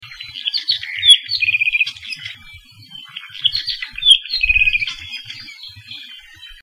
apapane call; Saddle Road Birding

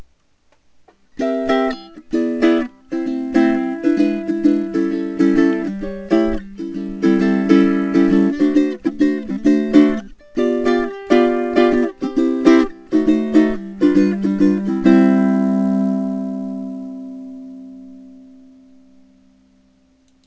4 tnr bash, ukuleles

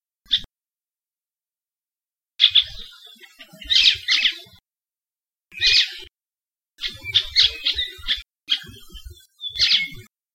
elepaio; Saddle Road Birding

